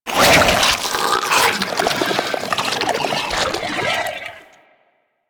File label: Tendrils Pull C; Warframe; Warframes